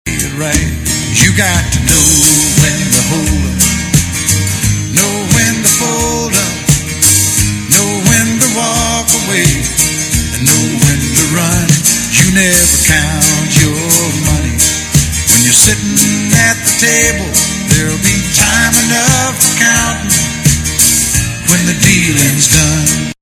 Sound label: Filter, Filtered Music